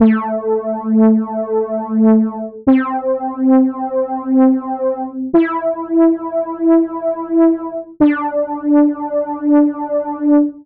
dark detuned synth brass lead in am

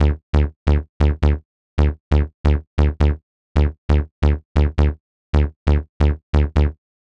aggressive techno brass sequence 135 bpm 4 bars

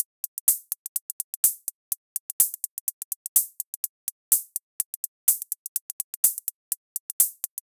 minimal house hi hat loop 125bpm

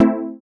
124 bpm house piano stab am

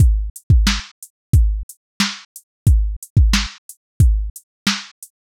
90bpm boom bap drum loop; 2 bars

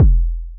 heavy industrial techno kick drum one shot

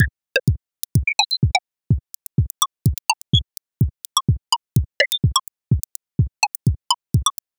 glitchy minimal house percussion 126bpm

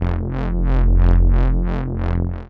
gritty reese bass key of f

